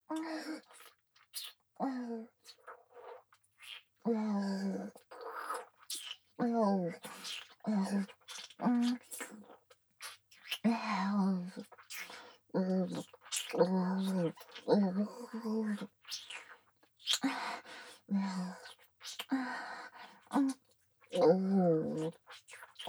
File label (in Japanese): フェラチオ・舐め・普通, ASMR; loop音声